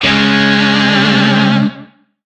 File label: Shawzin Chromatic Chord; Warframe; Lizzie Shawzin